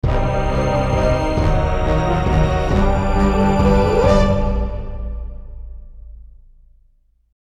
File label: Stinger- Combat Victory